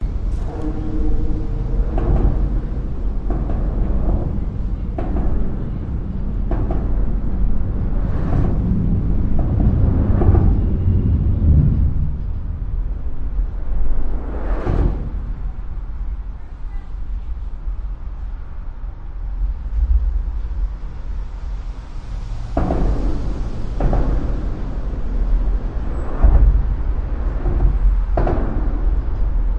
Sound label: under bridge cars trucks motorcycles busses pickups vehicles traffic; vancouver; lions gate bridge; your free sounds